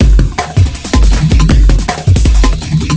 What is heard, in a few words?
Jungle Loop; Philips; Multimedia Studio